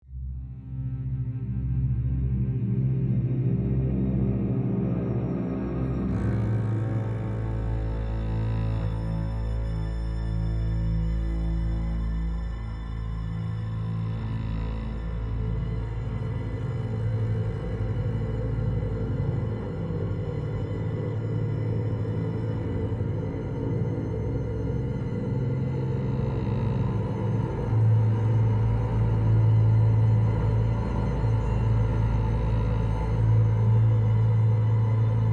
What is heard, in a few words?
Tense (Loop); The Walking Dead: The Telltale Series